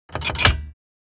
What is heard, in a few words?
Casino slot machine pull lever one armed bandit